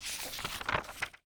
book

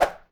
swing

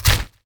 slash

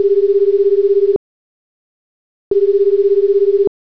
phone

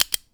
counter

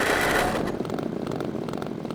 chainsaw start

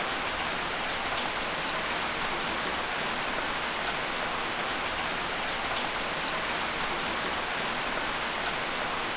rain

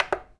put

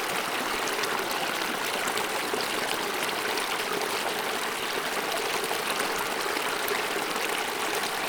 river

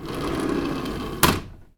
window

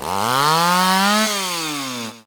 chainsaw

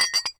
alchemist

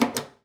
switch

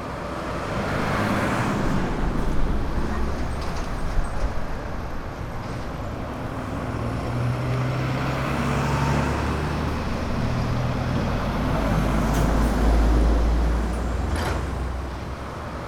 traffic

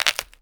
eat

